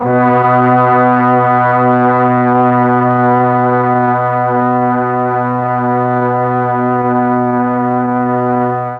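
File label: B2 half speed brass, mellotron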